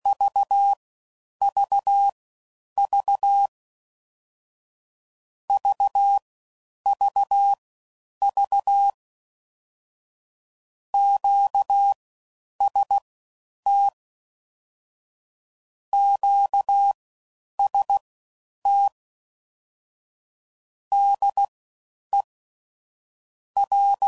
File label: All Dits, CW Code Groups 10WPM; Morse Code; QST de WA7RSO